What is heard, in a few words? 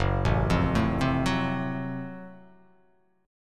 F sharp Minor Major 7th Flat 5th; chordshape; down